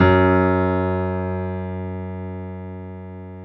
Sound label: PIA MICRS, MICRO-PIANO, Roland; Sound & Vision - Gigapack II